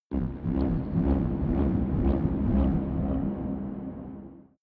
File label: space alien scavenger ship; raumschiff